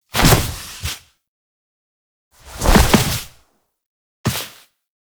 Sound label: Foley; Quests; The New War; Throne Room; Operator